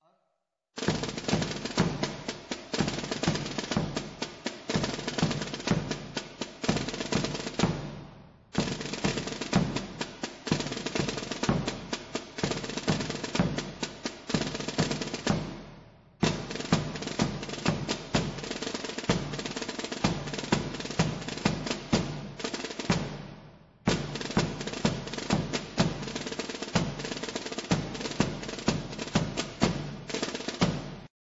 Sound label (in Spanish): palillera, tambores; Hijar